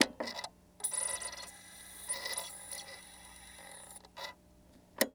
Technology Electronic CD Player Sounds Disc Loading